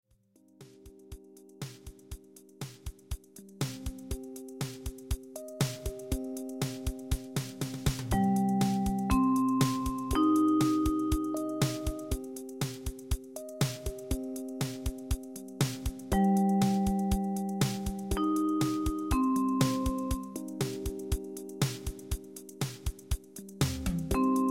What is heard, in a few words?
Min 2nd Vibes